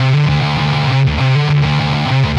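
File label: Distortion Riff Guitar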